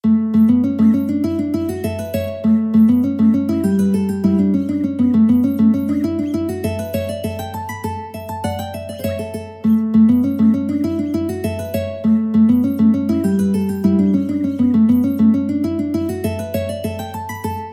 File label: mandolin; Grandmammy Look At Uncle Sam for Mandolin